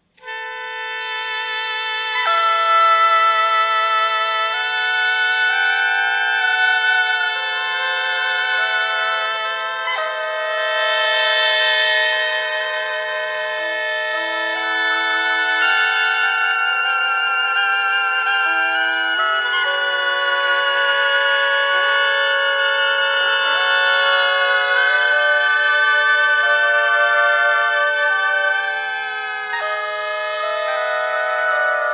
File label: 6 oboes